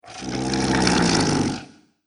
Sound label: That Beast Can Snore, monster